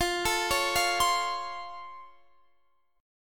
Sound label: Db Augmented Major 7th, F